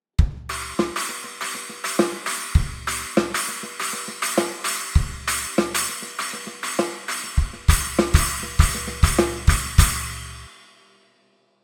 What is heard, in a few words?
Splash, cymbals; drums percussion; Gusta Star Light Cymbals